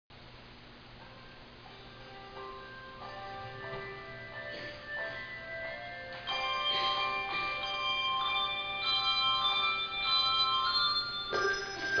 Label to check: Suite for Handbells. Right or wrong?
Right